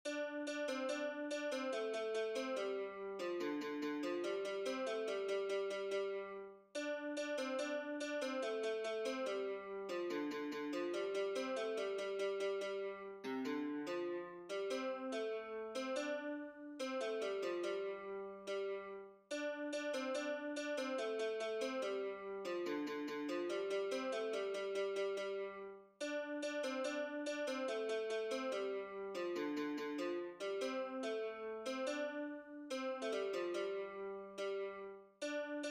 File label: japanese traditional; konpira fune